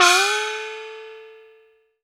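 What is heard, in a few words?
chinese cymbal high; AKAI S6000 CD-ROM - Volume 5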